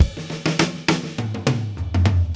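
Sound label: 102 bpm, beat loops; Zero-G; Monster Beats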